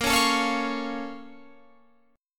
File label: Bb Minor Major 7th, Guitar, Open F; BbmM7 strummed